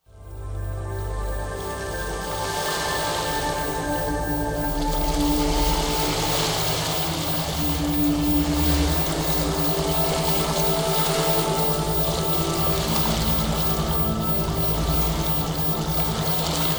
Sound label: Beta Brainwave Boost